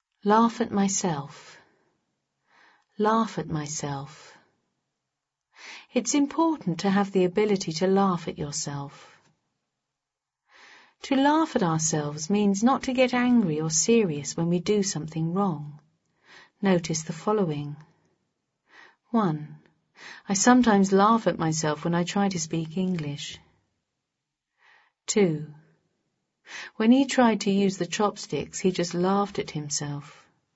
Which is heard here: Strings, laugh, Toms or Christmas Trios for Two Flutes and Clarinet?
laugh